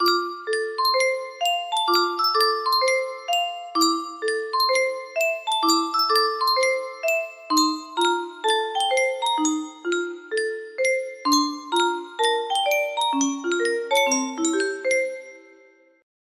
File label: music box, music box melody